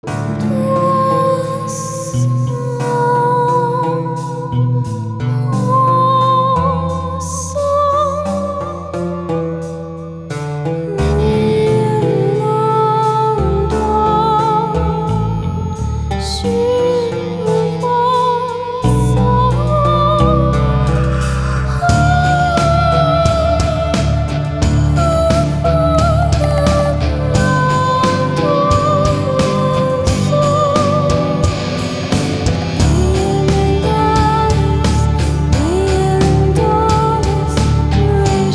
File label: Solo Vocal